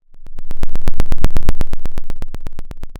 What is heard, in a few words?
Sound of a thunderstorm, center